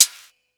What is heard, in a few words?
HAT, Hip Hop